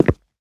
rubber, player footsteps

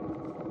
grenade blip loop; infestation; Half Life Alyx; xen

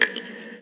drip, ambient weather

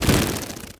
buzz soft, antlion wings; creatures

